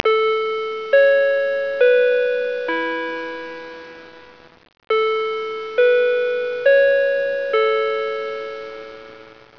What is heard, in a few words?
Chime; Chimes Bells